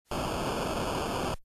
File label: low frequency white noise